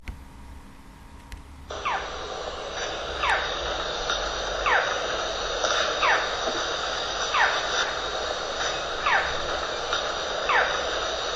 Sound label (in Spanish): halcon montes chico; tito